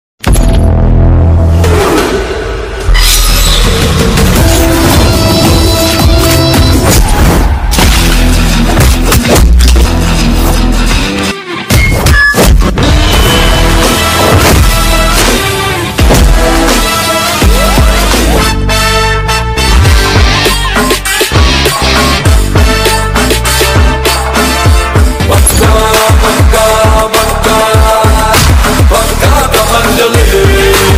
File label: teaser bgm ringtone beats; pakka commercial